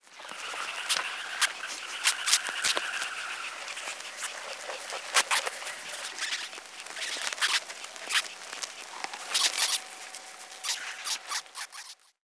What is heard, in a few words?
Ants singing